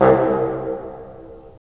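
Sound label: WND BN, wind bassoon; LA Composer vol. 3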